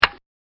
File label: click